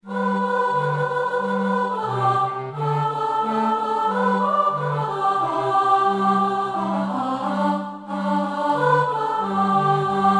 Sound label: Sop, choir